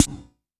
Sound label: F#, synth line; 90 s Sample CDs; NiceBeats; SynthLine VOL-3